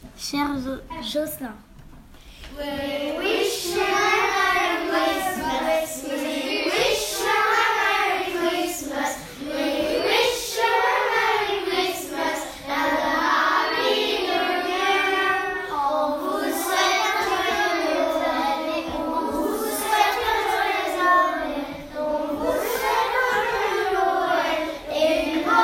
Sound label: chant we wish you a merry christmas